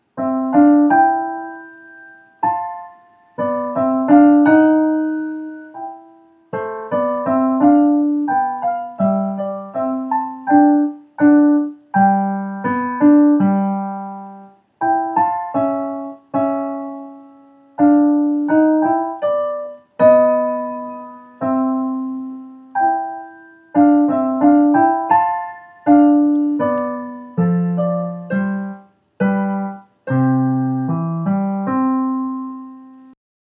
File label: Piano Practice